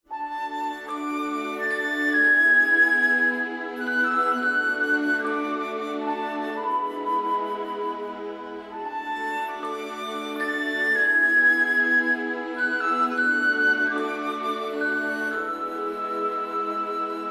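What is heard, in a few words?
Bgm System Opening Short; Sekaiju to Fushigi no Dungeon 2; 3DS gamerip 2017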